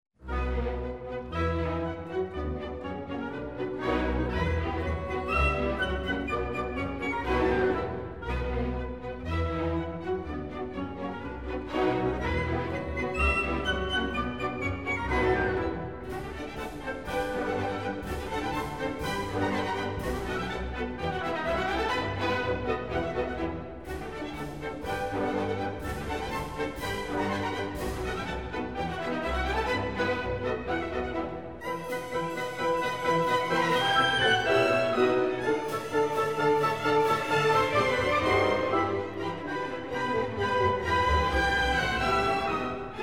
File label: E-Moll in E Minor Vivace; Ungarisches Festival